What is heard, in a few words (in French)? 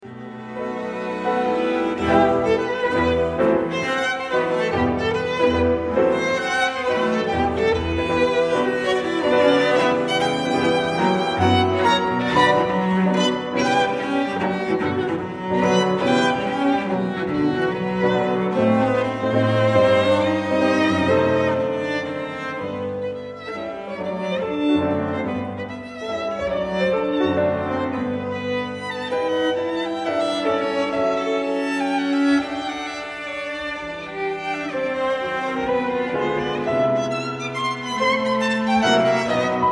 trio pour piano violon et violoncelle, andante; SCHUMANN (Clara); op17